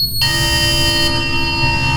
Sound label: sonar Ping Water Medium Beacon Directional; Barotrauma; Real Sonar